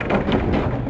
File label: Wood Gears; Sid Meier's Civilization 4 Complete; Warlords; Units